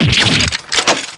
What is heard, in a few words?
damage; drebber